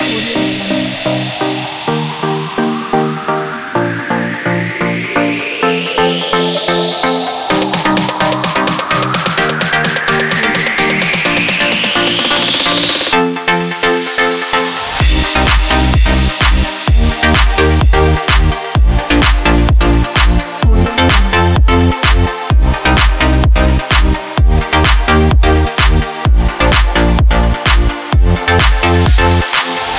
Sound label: electronic